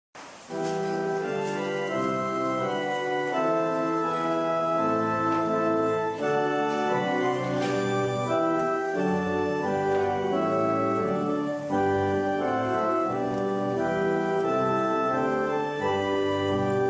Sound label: choir